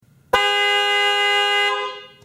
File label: piaa automotive horns